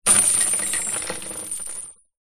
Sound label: industrial chain rattle set down wood